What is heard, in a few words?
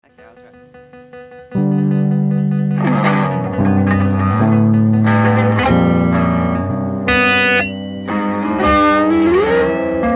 fancy whistle